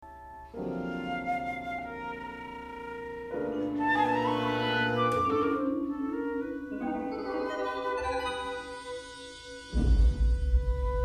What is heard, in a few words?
saraband